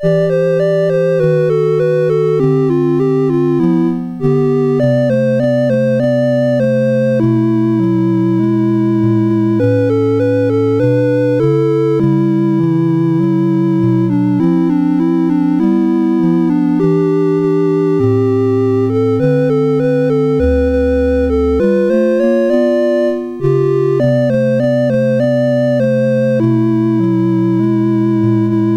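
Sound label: MOS 8580 SID chip; CSG8580R5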